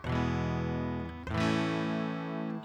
chord

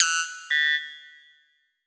white bellbird

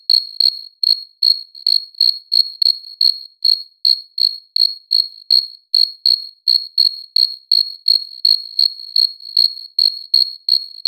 crickets

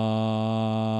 whistle

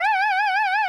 voice

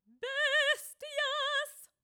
choir too slow

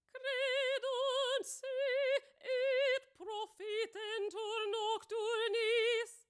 choir

